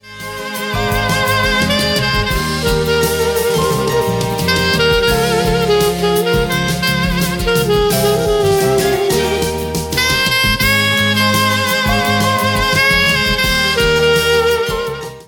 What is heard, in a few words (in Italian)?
Orchestra